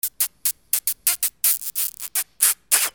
CARTOON

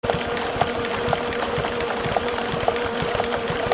INDUSTRY

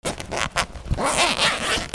HOUSE

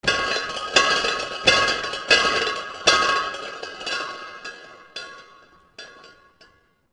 BANGS

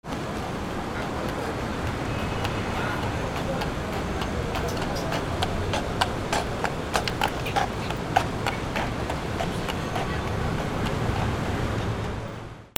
AMBIENCE

